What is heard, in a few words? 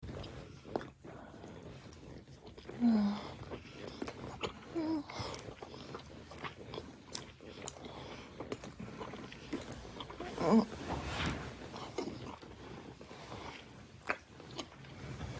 suck, moans; The Witcher Hunt